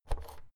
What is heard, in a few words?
oven knob press in hard